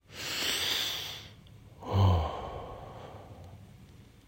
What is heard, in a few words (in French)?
Soupir; Index des soupirs; Hadjithomas Joreige; Rabih Mroue